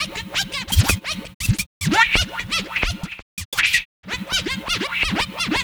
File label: DJ Work; Sonic Foundry (Sony Creative Software); Stylus Pressure Urban Grooves on Digital Wax; Wickey Wah